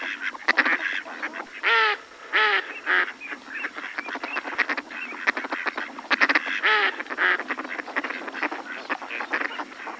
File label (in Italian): germano, birdsound